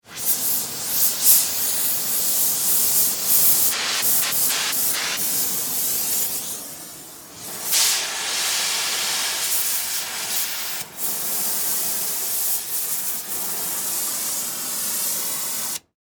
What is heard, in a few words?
Industry Blow Torch Operate; Factories